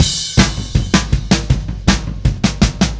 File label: Happy Pub Beat Crash B; Sonic Foundry (Sony Creative Software); Indie Rock Anthems; Session 05 - Happy Pub